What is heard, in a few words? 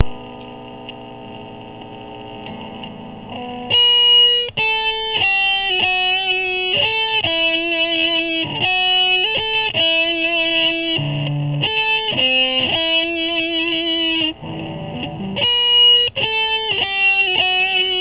Guitar Playing